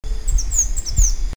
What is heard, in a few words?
Conirostrum speciosum